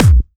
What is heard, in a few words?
Bassdrums Clubby Kicks; Vengeance Samples